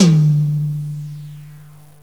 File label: Melo Tom; GMTones; Percussive